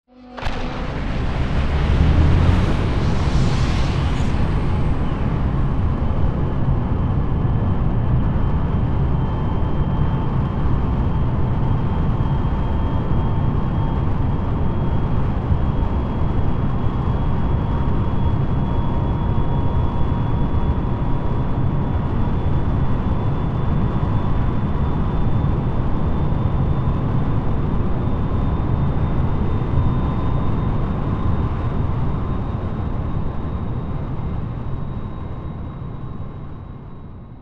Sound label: small ship takeoff; Titanfall 2